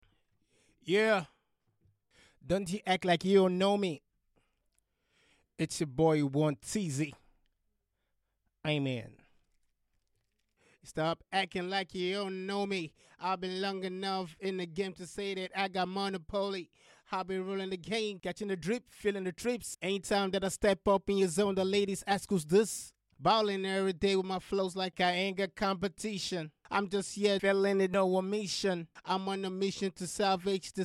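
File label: hip hop 156 D major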